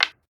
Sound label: Wooden Object Place